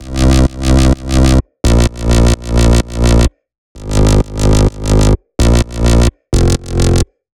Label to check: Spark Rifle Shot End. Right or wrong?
Wrong